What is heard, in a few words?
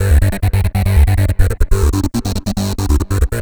Synth Bass; Basses